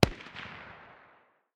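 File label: light machine gun far distance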